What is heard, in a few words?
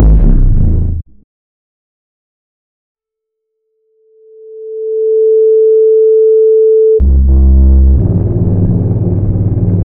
success for completing a, task